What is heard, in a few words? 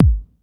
BD, Dance Mega Drums; Best Service